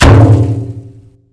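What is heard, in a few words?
grass; Mafie 1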